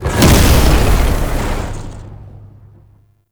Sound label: Lancer Frenzy; Warframe; Tau; Prime Grineer